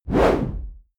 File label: whoosh noise sweep hit low medium